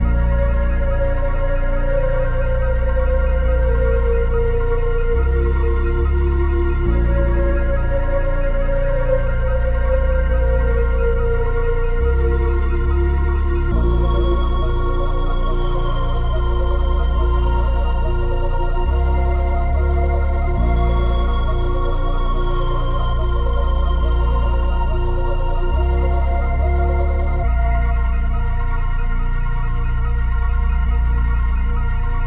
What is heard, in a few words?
140 BPM A# Min, Melody Loops - Trap; Archangel